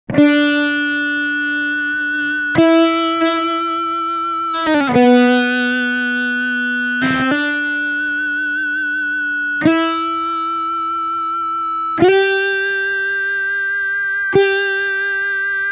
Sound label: Sustainiac 2 Octaves up